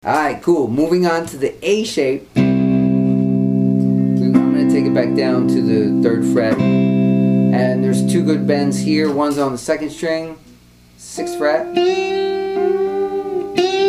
bends a shape

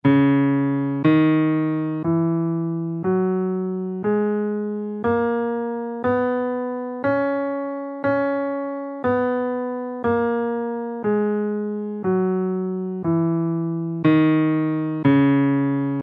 dorian scale in Cm, guitar

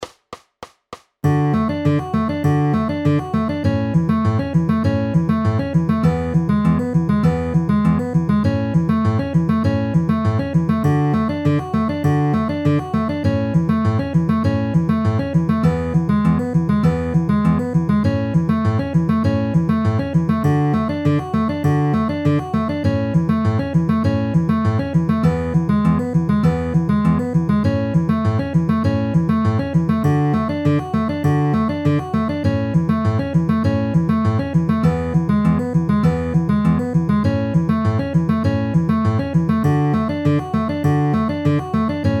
D A E A pinch at 200 bpm

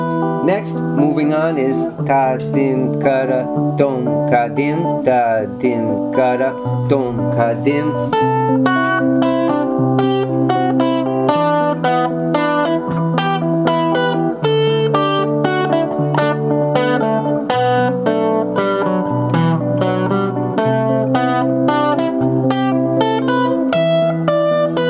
claves to rock vamp

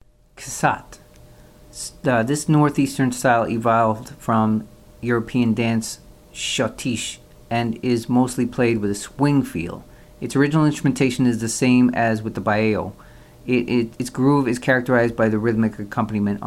xote; brazilian styles

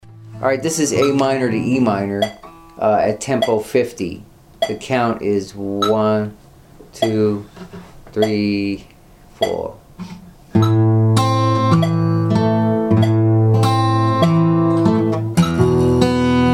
travis picking outer inner Am to Em at 50 bpm

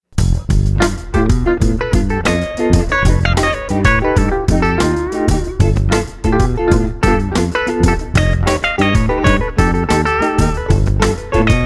whole tone

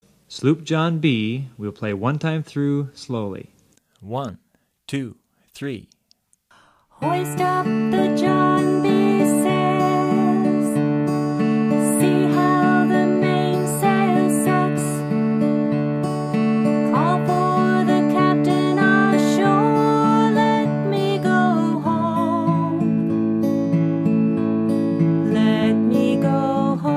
travis picking

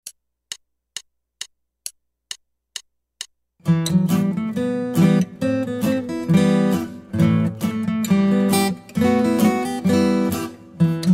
Guitar

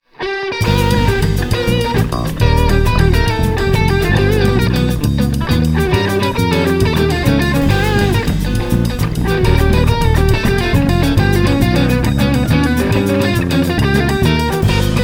halfdiminished

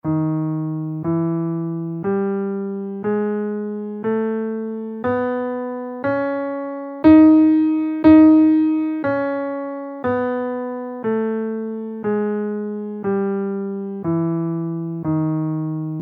locrian dominant scale in Eb, guitar

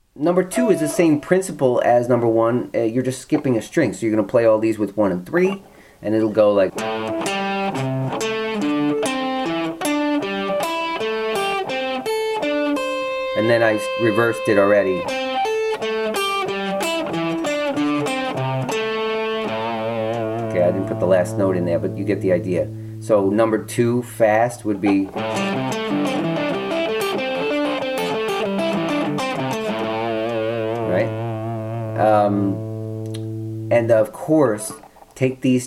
hybrid picking exercise, guitar

